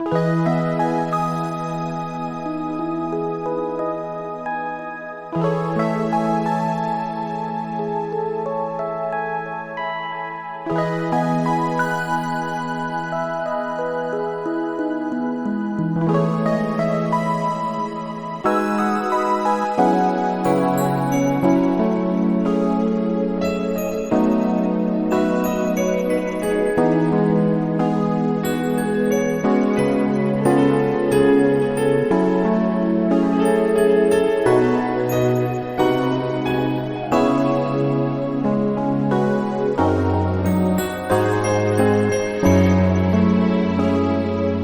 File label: quiet air